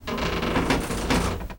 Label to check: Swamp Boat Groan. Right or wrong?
Right